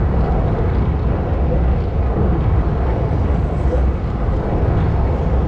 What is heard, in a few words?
amb, machines; Quake 4 Alpha; id software